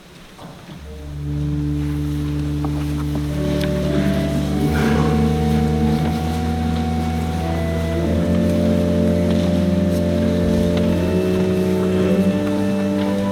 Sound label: church organ end; best service Blue Box